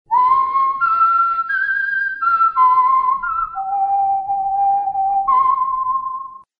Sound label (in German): pfadfinderpfiff gepfiffen; Klingeltöne